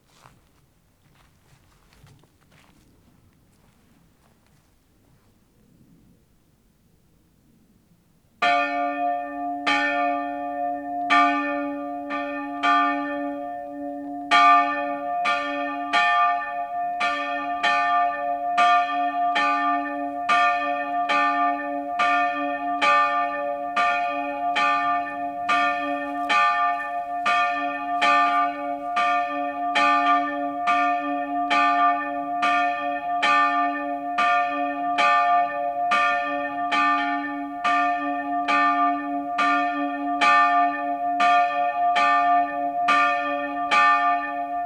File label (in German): glocke steinmocker